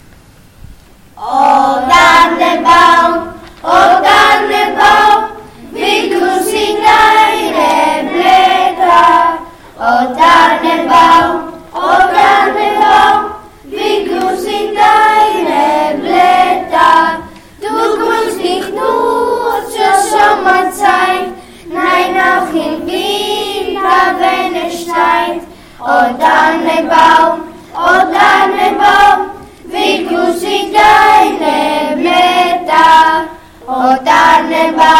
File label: Tannenbaum